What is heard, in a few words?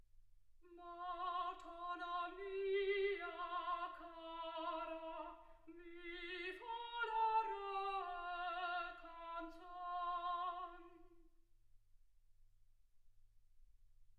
soprano